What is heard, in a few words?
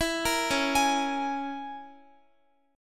Db Minor 6th Db Guitar; arpeggiated; x,x,14,15,13,15; 4E,4A,5D,5G,5C,6F; Dbm6